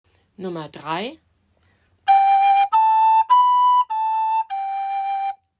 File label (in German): Saxophon